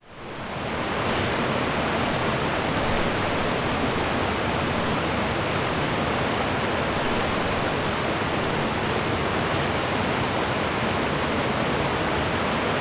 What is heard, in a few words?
Waterfall (distant perspective); Sound Ex Machina